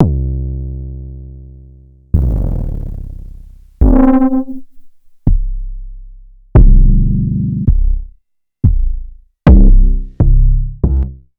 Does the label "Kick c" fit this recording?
Yes